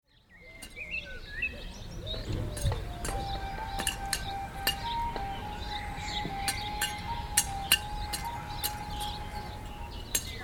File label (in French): jardins radios; cartophonie